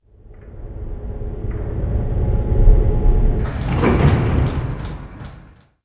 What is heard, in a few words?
ELEVAT